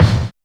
kicks

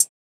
Hi-Hat; Closed Hats

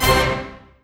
Stabz

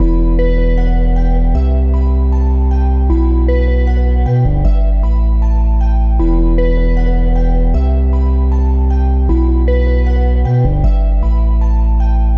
Melody Loop 155 BPM E Min; Cymatics; Astro Mode

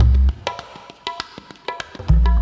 Loop

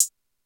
HiHats; Closed Hats

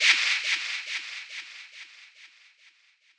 Perc; Wicko Wicked

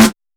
snare march

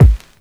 Kick

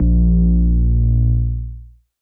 808s

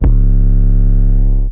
808 dirty C#; 808s

